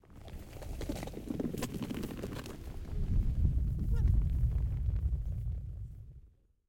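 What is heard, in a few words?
animals wildebeests; serengetikings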